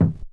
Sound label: Tom Dirt